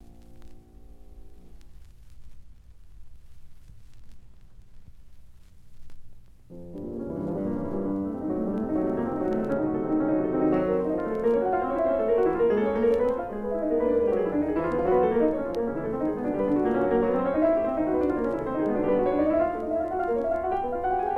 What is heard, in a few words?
Sonata, Funeral March; No. 2 In B Flat Minor, Op. 35; Fourth Movement